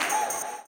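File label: tambourine, 160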